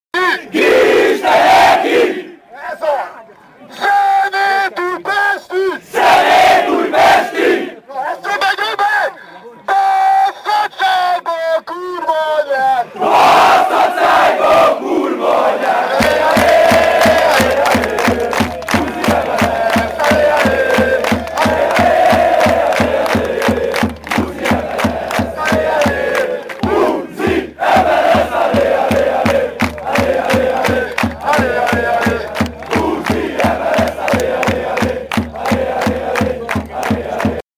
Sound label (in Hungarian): Kiiiiiisteleki; Kiiiiiisteleki!!!!!! / Szemét újpesti! / Basszad szájba a kurva anyád!!! / Áléálé Áléálééé / Buzi MLSZ, áléálééé; militiagreens; szidas